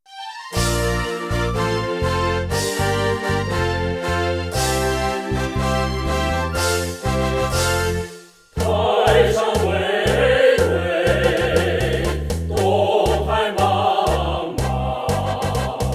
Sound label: Khoo sing